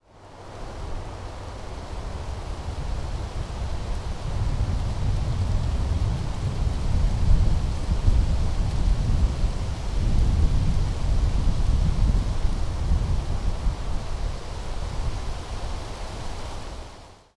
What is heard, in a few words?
wind in trees by Merced, Yosemite; Wind in the trees by the Merced; Spring trip to Yosemite Valley